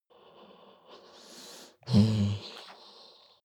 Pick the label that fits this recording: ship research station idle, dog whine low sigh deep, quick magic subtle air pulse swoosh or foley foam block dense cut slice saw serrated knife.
dog whine low sigh deep